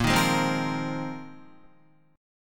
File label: Bb 7th Suspended 2nd Suspended 4th Bb, Guitar 4E 4A 5D 5G 5C 6F; Bb7sus2sus4; strummed; 6,6,6,5,5,5; JGuitar